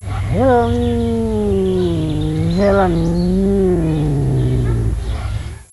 serval cri

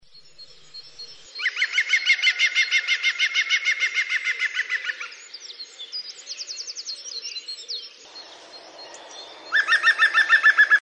picvert pivert cri, cris d animaux

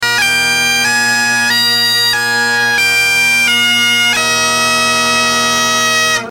cornemuse son

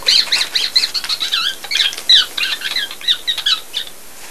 rat cri

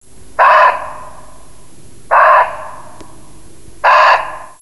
muntjac cri

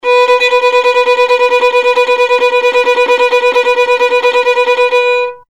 violon son

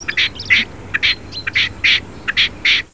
perdrix cri, cris d animaux

